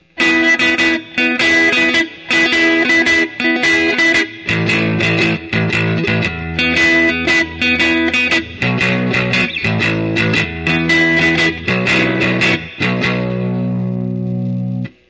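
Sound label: Lightning Lead Solid State Hot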